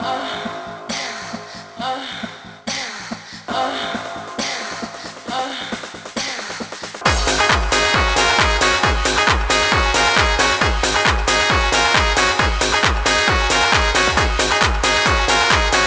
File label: electric fruit orchestra